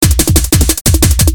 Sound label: Loop